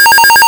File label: cricket, Cockpit; A320